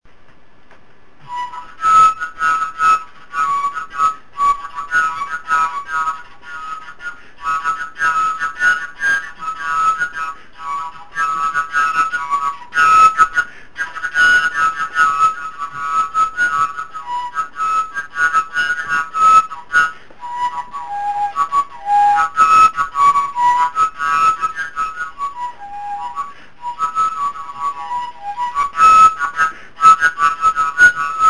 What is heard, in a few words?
flute